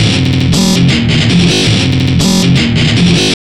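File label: guitar, Jungle; E-Lab; XTC Files of Jungle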